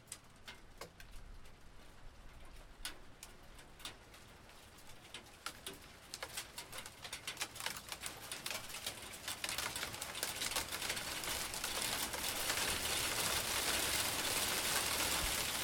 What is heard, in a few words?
Weather Hailstorm, hail storm with cccasional thunder claps.; 5 Minutes hail storm with cccasional thunder claps; This unique recording starts with light drizzle, builds up to a f; Snow Sound Effects